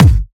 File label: dry bass drum one shot G sharp key, kick single shot tuned to the G# note